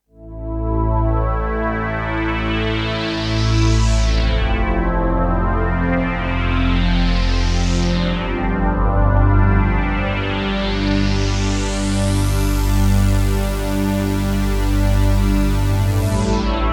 Iridium Core PerfectSweep

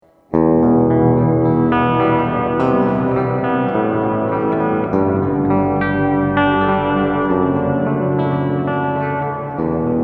Slö Dark

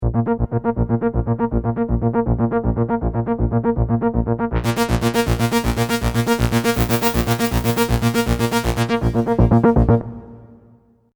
arp chorus

